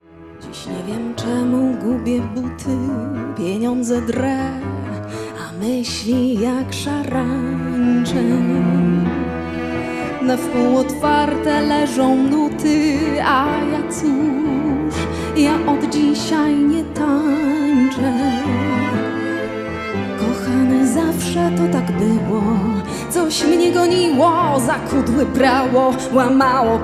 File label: Tango